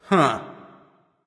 confused; erlangshen

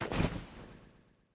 footstep; smite; chaac

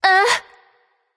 surprised; smite; terra

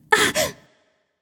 grunt hit; smite; artemis

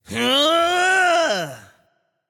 battle cry; smite; odin

